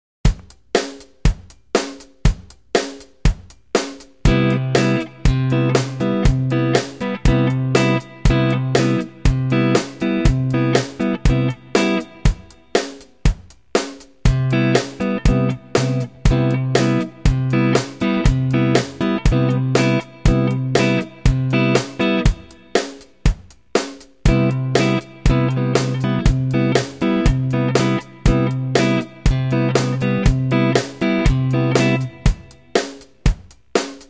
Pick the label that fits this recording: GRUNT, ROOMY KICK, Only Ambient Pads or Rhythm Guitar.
Rhythm Guitar